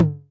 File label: Electric Tom, Yamaha DTXpress